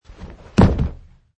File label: Landing; Man wearing jeans and hiking shoes jumping and landing on carpet surface - variation 20; Wrestling and Struggling; Michael Huang